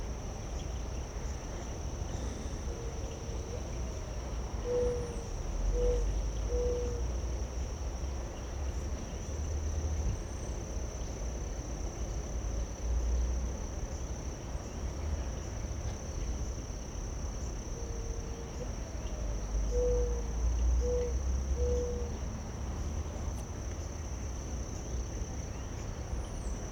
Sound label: Late Summer Crickets, Doves; Electa Quinney